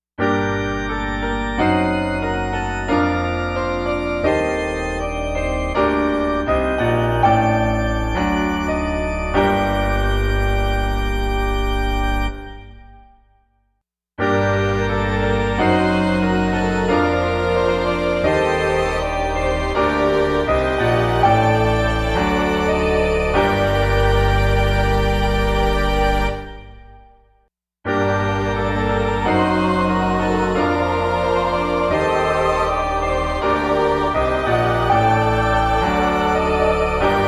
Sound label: Piano and Pipe Organ buildup